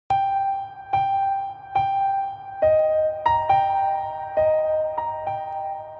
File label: March imperial